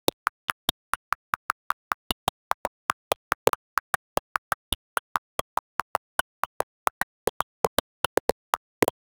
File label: a cartoonish menu sound